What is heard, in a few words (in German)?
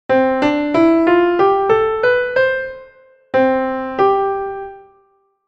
Intervalle C Dur